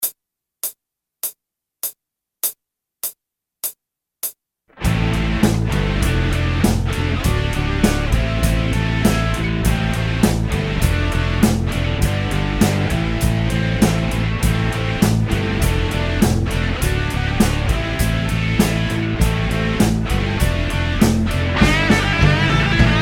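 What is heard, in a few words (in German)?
8tel Play Along mit Drums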